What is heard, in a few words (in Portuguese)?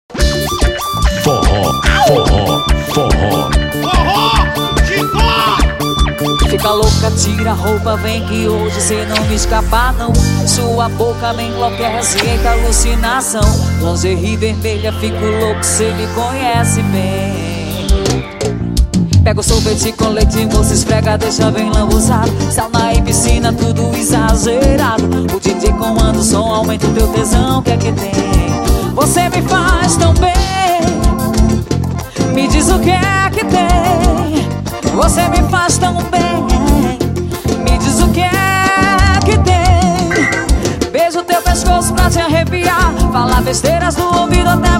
forro chicote